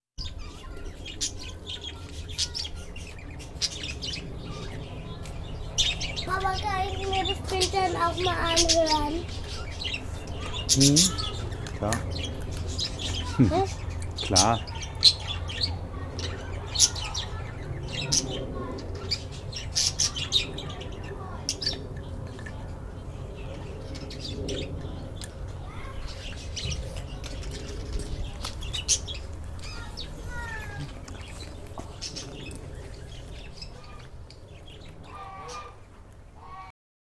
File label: Bird in Germany with Daughter